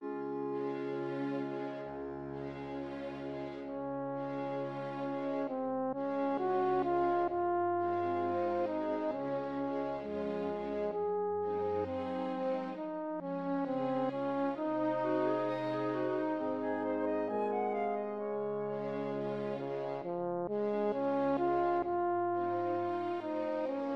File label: Nocturno